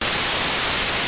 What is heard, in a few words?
white Noise